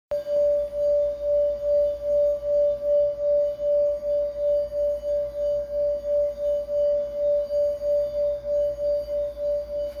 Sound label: Plain Brass D; singing bowl; Flower of Life Purple Rounded Meditation Singing Bowl – 8″D